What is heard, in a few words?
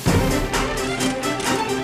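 mountain world music cage forcefield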